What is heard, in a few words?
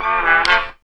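horn riff; E-Lab; X Static Goldmine 1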